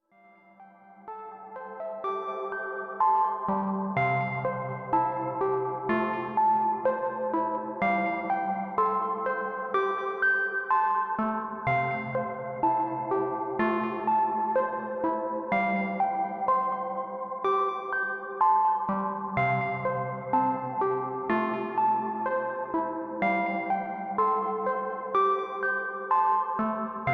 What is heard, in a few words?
new age dull house; analogsynth